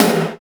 PROCSTOM HI, Drums; Drums In Your FACE!; FILM KIT2

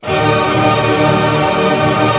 ARP A MAJ FF, STR ARP; Sound On Sound; Peter Siedleczek's Orchestra

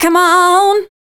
FEMALE, Dance Vocals

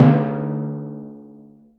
TYMPANI D3; Greytsounds VOL-1

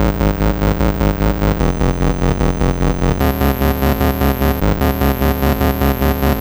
Atari 2600 Bass, chiptune

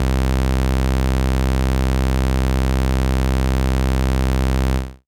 Synth Prophet Bass C; Electronisounds

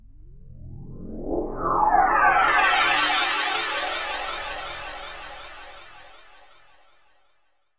WARP, SCI FI; AKAI Anime Sound FX

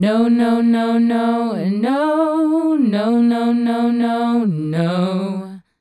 Female Group, Hip-Hop and RnB Vocals

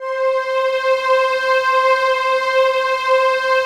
PAD, DIGI-PADS

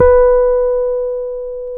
PNO, H.Pianet

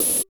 Drums; Distored Reality

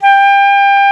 FLT FL G5, Flutes; Roland LCDP04; 90 s Sample CDs; C Flutes 3-8; C Flt Sh 6&7

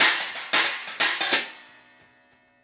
Hi Hat Pattern

